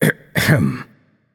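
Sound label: nonverbal clear throat; voicepack; smite; merlin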